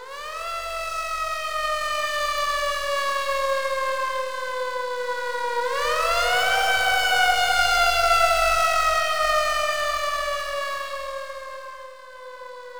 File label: siren; norleans